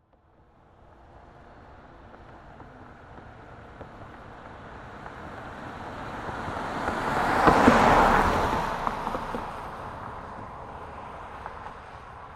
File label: Car Pass By, Transportation, Automobile, Car, Pass, By, Car, Stereo; SoundEffects-BlastWave